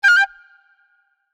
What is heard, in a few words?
Recorder, notifications; Samsung Galaxy S22